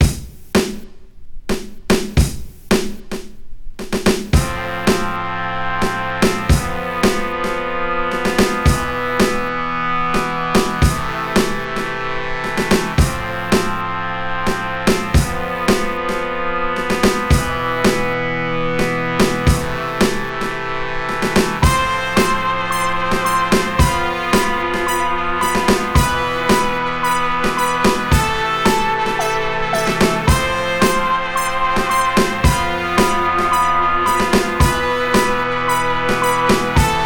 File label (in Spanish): procesion paso drumbass; Procesión del Santo Serano: Paso 1 - Drum&bass